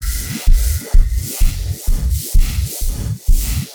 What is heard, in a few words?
Loop 128 bpm, Stretchmangled; 99Sounds; Mangling Audio